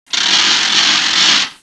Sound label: RATCHET